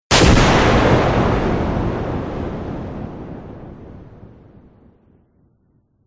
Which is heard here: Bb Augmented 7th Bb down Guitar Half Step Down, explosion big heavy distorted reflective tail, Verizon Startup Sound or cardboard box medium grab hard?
explosion big heavy distorted reflective tail